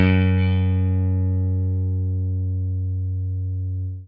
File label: FENDER HRD, Guitar & Bass; Quantum Leap